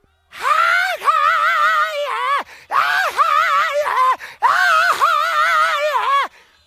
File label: heheyeah heheyeah heheyeah